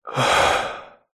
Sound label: human male exhale sigh relieved relaxed; zapsplat